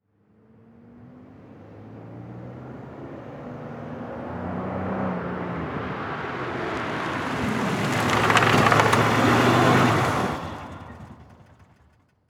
Car Monte Carlo Drive Up Shut Off Quickly; transportation

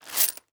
Foley Coins In Plastic Bag Picking Up From Cement

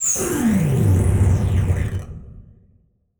Transition Laser Distorted; Production Element

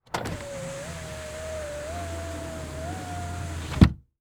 Jeep Commander Close Window Interior; transportation

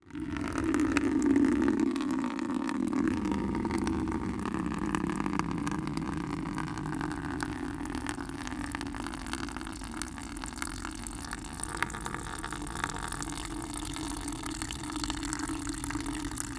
Liquid Water Pouring Into Empty Bucket

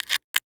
Glass Sharp Glass Scrape; Foley

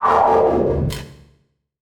Title Transition Horror Dark; Production Element

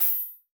Multimedia Internet CD-Rom Flash Ding

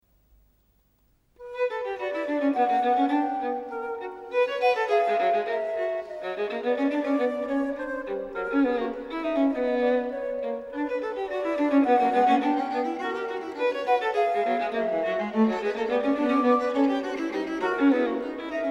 Canon 3 a 2 per Motum contrarium; Bach; Musical Offering BWV 1079; Ensemble Sonne

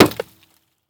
Weather Snowball Hitting Wood; AdobeCC

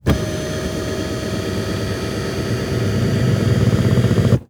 Truck GM Envoy Adjust Seat; transportation

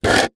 Foley Plastic Container Scrape On Cement Short

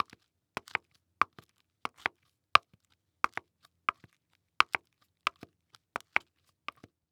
Foley Footstep High Heels Walking On Cement; Foley Footsteps

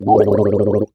Human Male Gargles Water Short

